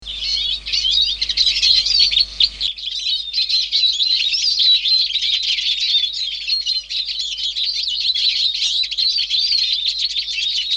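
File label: Siskin; Madarak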